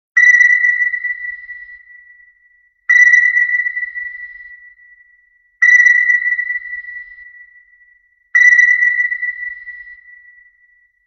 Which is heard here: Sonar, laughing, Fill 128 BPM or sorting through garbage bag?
Sonar